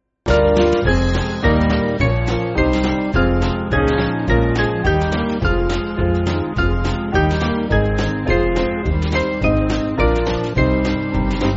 Band, church music; The Kings Majesty

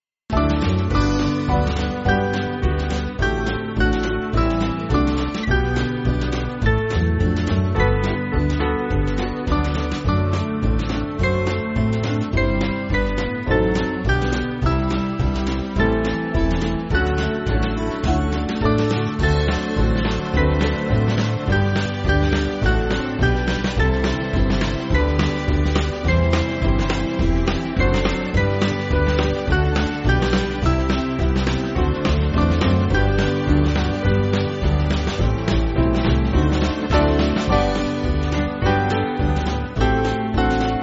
Band, church music

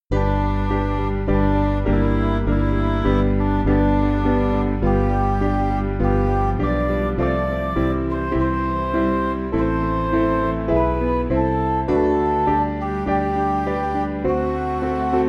Music Backing Files for Churches; Open Mine Eyes

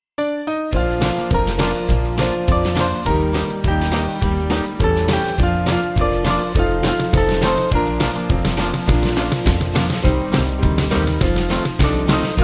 Hallelujah, Band; I've Been Happy

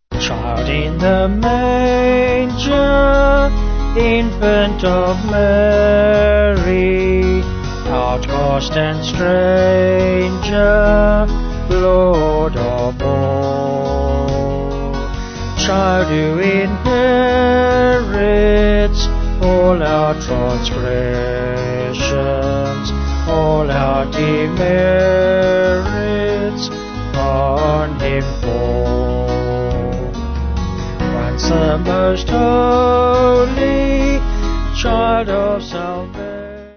Child In The Manger Bunessan Vocals Band

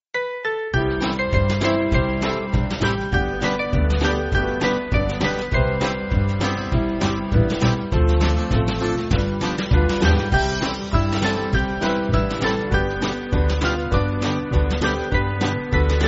Band

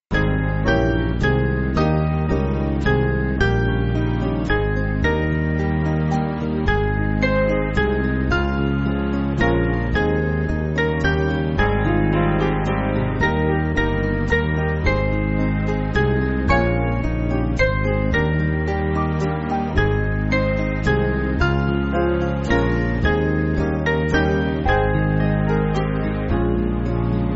Band, church music; Come Gracious Spirit; Mendon

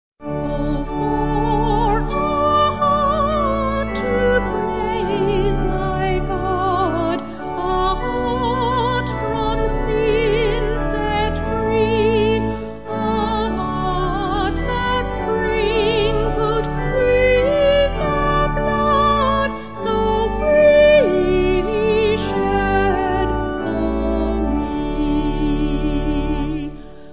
Vocal Organ

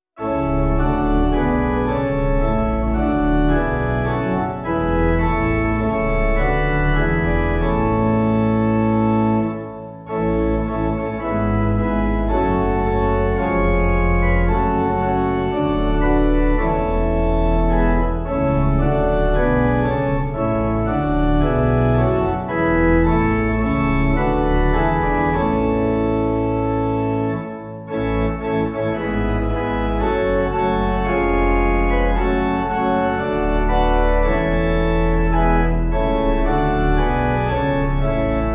Come Let Us Join, Pipe

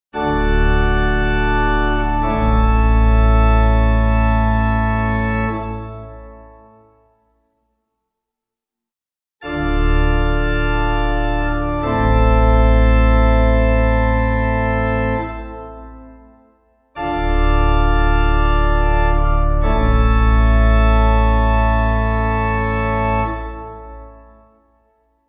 Amens Simple A Pipe

